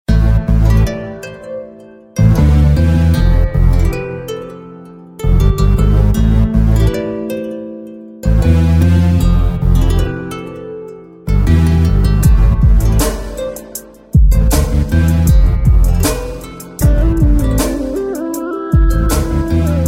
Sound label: Beautiful Romantic